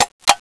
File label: m32 open, weapons